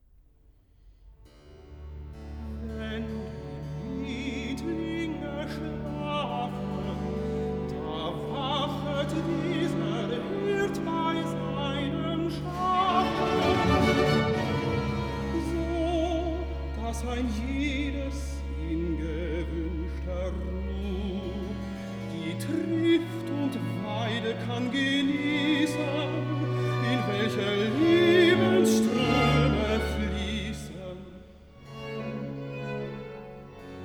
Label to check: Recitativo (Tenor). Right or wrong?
Right